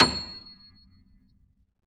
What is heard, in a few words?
C 7, Keyboards Acoustic, GRANDP ST; USB Soundscan vol.17